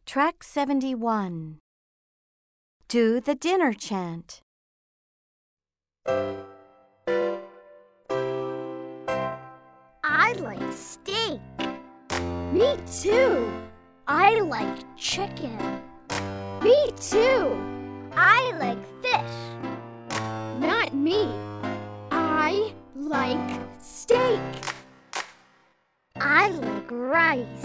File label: the dinner chant slow